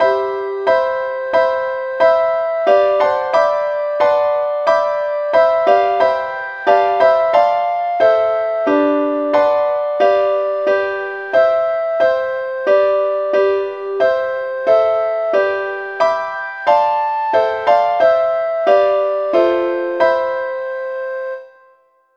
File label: advanced lead key C; saintthomas